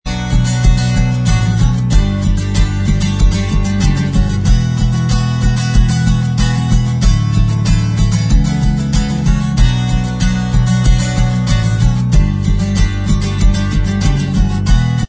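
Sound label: acoustic version instrumental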